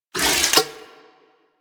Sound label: Shotgun Fire Stop Mech C; Weapons; Warframe; Tenno; Alchemist